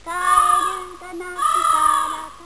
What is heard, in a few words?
Bird; 特酷声音素材库